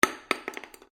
Cartridge shotgun 12 gauge impact polished wood, shell casings; shell casings of different calibers impacting on different surfaces